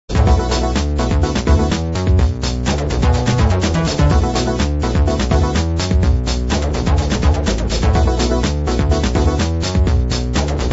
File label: Disco